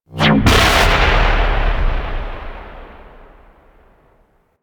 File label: Anchors Away Loop End; DE; Warframe; Weapons; Archwing; Powers; Archwing Healer